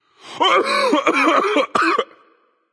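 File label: nonverbal clear throat; smite; voicepack; merlin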